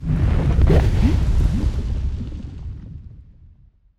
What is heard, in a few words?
molten planet; ambient; Stellaris